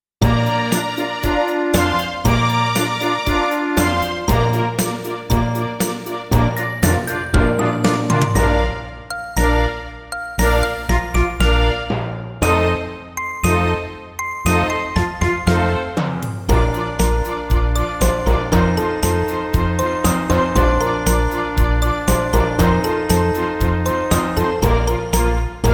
Gavotte F, Backing

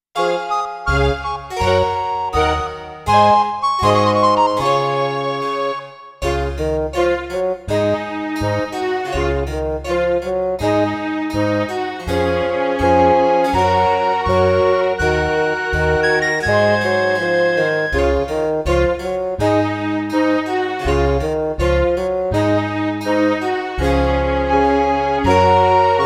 Gavotte Eb, Backing

